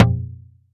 Tom

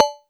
Cowbell

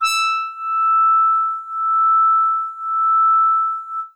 Soft Horns DX100 E6

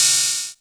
Hihat Open FX

